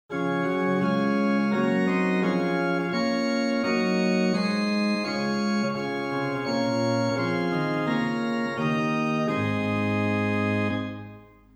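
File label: Gedackt 8 + Rohrflöte 4 + Octava 2, organ; Reinhardtsgrimma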